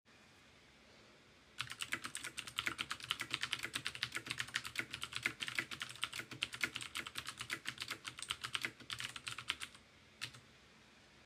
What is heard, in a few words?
Cooler Master clicky keyboard